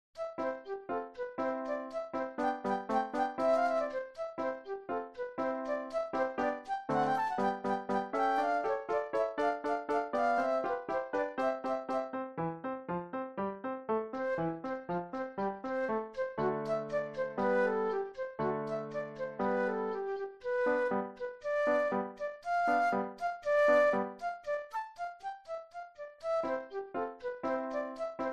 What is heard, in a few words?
Allegro Scherzando Flute Solo; classical; Haydn